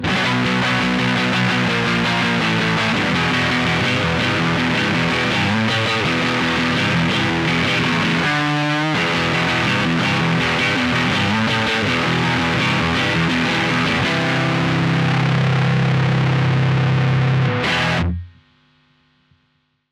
Full Gain; Orange Crush 20RT

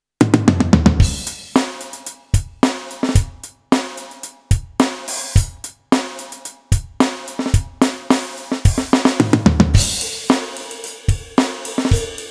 Funk kit; alesis nitro ultimate

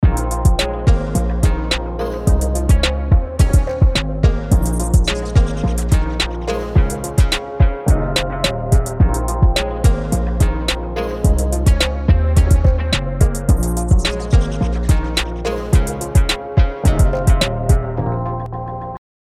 AKAI Professional MPK Mini MK3 Trap

